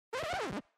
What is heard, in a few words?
Record Scratch; RODECaster PADS; UNIFY